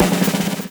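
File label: drum roll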